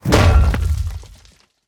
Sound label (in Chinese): 重锤地面重击声